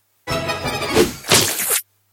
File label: Goblin Carnival